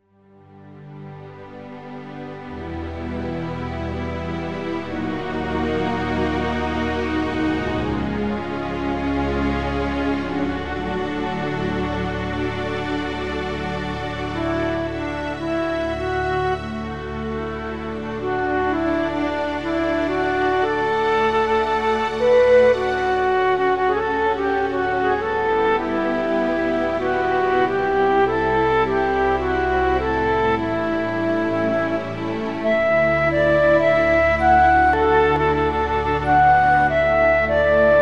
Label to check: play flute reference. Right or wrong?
Right